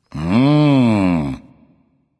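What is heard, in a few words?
thinking, nonverbal; smite; voicepack; khepri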